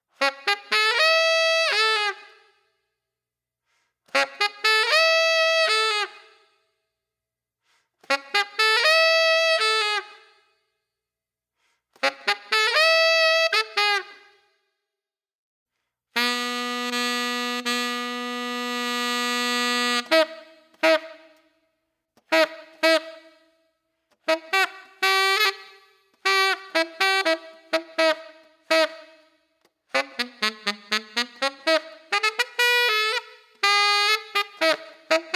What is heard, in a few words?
Sax SOLO